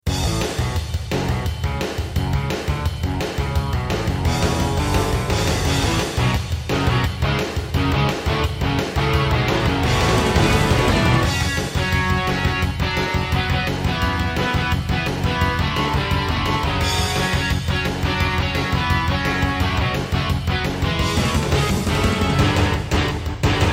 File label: Roland SC-8820; Duke Nukem 3D